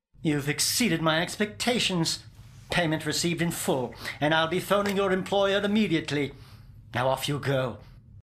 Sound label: Concierge success (cinematic)